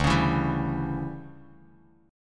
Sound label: D Major 7th Suspended 2nd Suspended 4th, Bass Standard; strummed